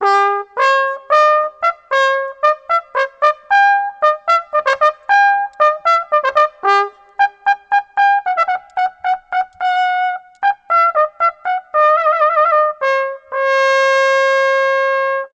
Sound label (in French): Trompette naturelle